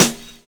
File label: analog fi; Univers Sons - Kitch 70s